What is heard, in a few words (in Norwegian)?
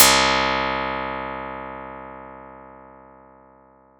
Gitar streng